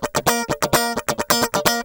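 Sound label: GUI 130 A#, guitar; Best Service Gigapack 2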